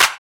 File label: Clap, Percussion; Sample Banks